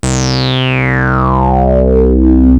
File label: rez screech, Chroma Polaris; Rhodes